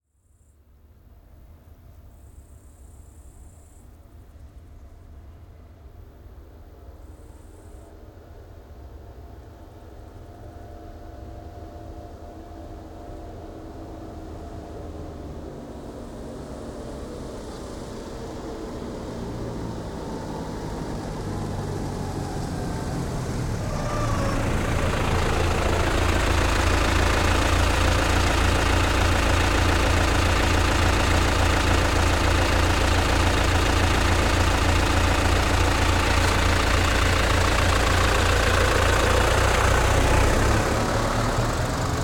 tractor Case II CX90 exterior braking idle and slowly drive away stereo; SoundHolder